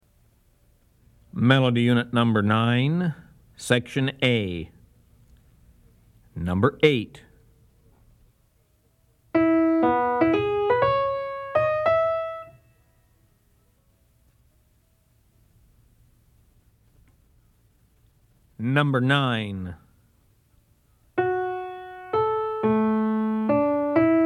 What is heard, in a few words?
melody, ear training recordings